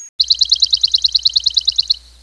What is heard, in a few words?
Bird; 特酷声音素材库